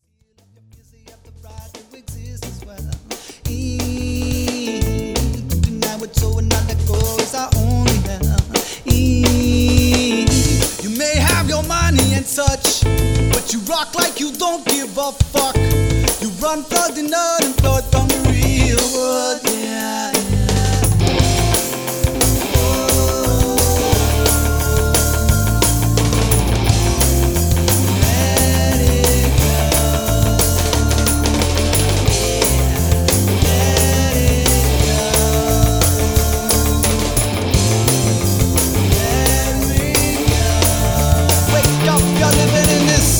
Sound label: Unplugged